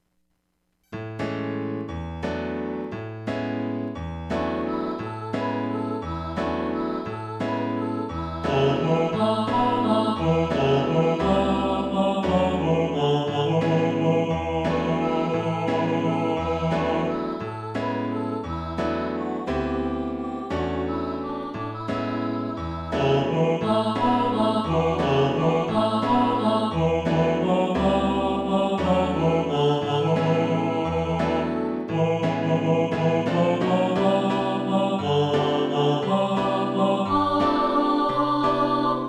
tenor, choir

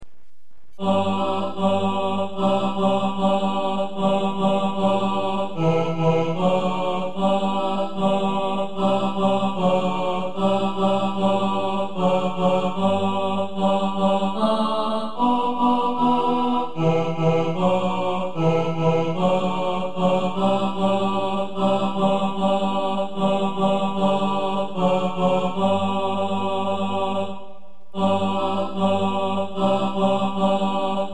tenor

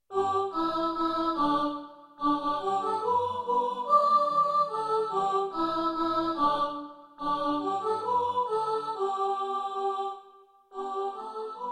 soprano, choir

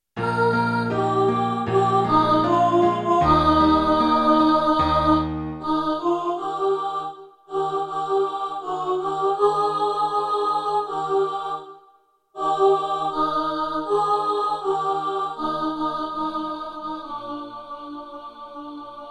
alto

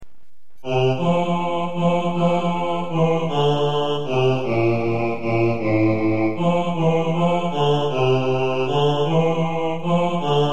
bass, choir

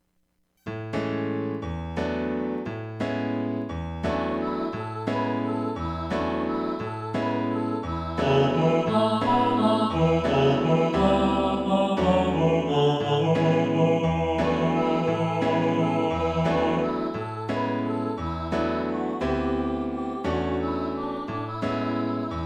bass, choir

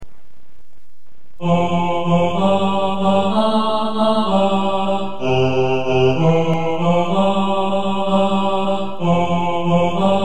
4 part tenor, choir

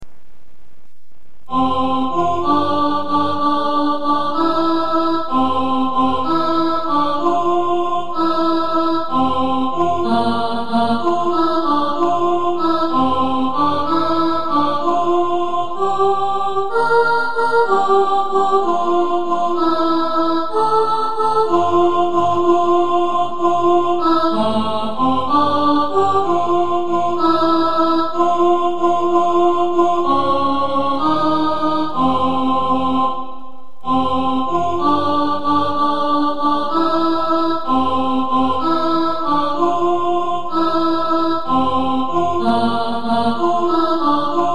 4-part alto, choir

